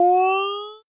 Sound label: step in beam; duck game